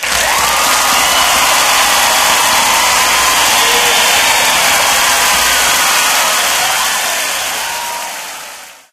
crowd walla intro; Source Engine; tf

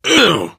reaction disgusted, biker, voice

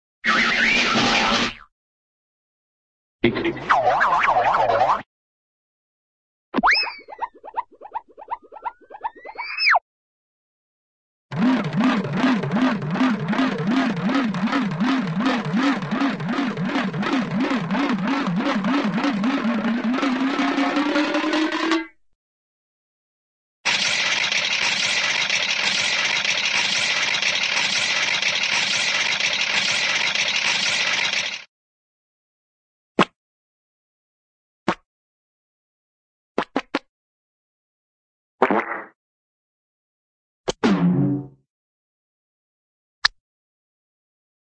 spin, cartoon spin and boing

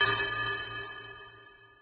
amb random tech; source engine; portal2

